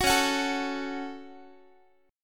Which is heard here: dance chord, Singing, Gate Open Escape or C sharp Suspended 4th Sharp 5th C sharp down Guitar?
C sharp Suspended 4th Sharp 5th C sharp down Guitar